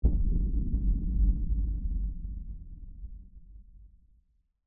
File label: Baton Boom 8vb